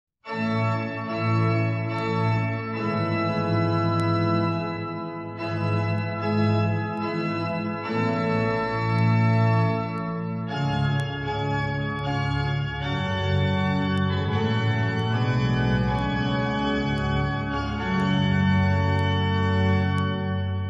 Sonata D, organ trumpet; Torelli

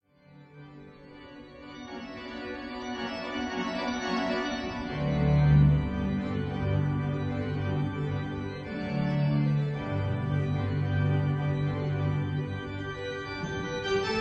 fanfarria, organ; heschke; lemont

